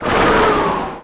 spell mighty cast; fighter

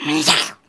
small attack

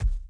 walk dirt; sura

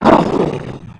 fall act, monster skeleton king

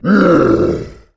big attack, voice

